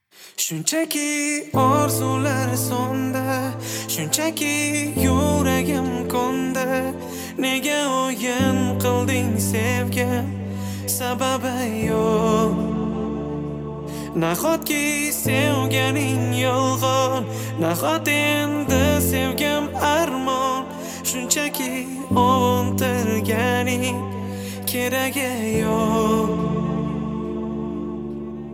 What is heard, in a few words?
piano